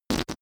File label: whoopee cushion fart double